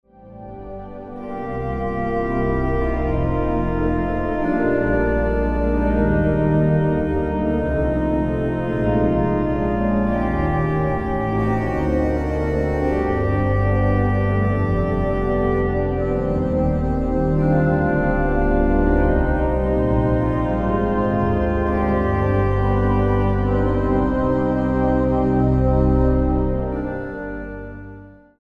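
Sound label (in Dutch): Psalm, orgel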